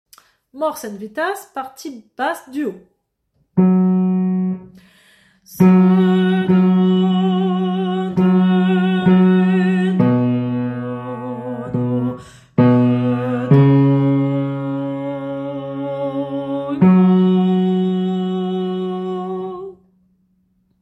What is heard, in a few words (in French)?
partie basse du haut; Mors et vita